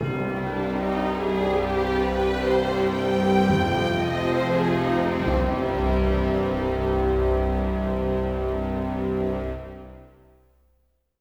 death music; Spider Man 1; XBOX; ENGLISH

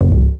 Hit Fail

